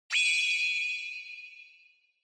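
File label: Onoma Flash Low, 光る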